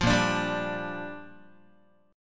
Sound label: A sharp 6th, Guitar; strummed; JGuitar